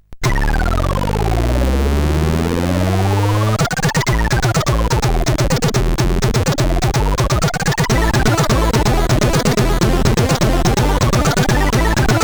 CSG8580R5

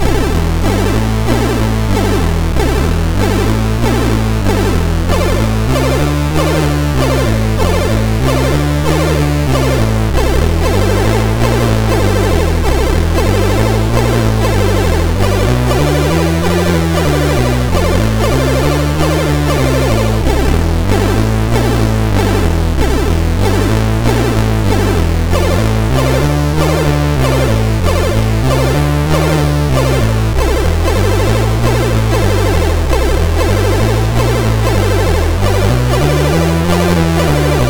Boogie Woogie; MOS6581R3

